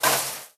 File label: bite; Apotheorasis